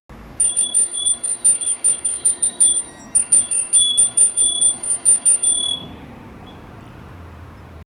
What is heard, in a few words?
tiny bicycle pedaling sou; neighborhood